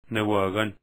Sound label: snare